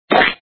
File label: Snowman Bad Hit; sumo snowman